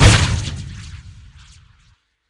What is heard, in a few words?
rocket, fire